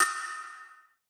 Cash Perc; trap; Percussion; Cymatics; Trap Starter Pack

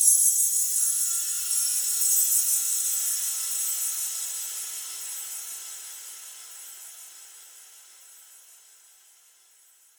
Bell Tree Am 60Bpm; Construction Kits; Ghosthack; SkyRealm; When The Bell Tolls

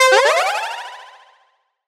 Collectibles Various FX; Video Game; Cymatics; Video Game Sample Pack Vol 1

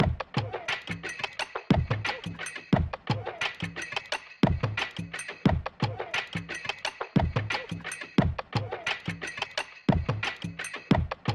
88 BPM C# Min Percussion Loop; Cymatics; Promises

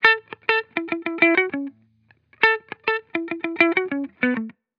Vibrations Pop Guitar Loop 100 BPM A Maj; Cymatics; Vibrations Guitar Sample Pack

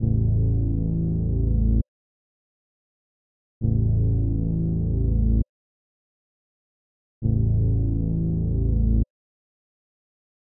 133 BPM A Min Bass, Drill; Melody; Stems; Cymatics; 2022 Melody Collection (BETA); Smoke

